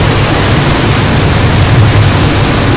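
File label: fire, noisy, active, distant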